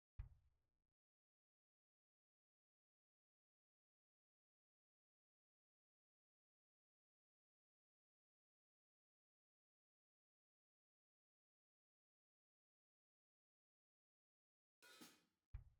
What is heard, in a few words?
drum; JASS Session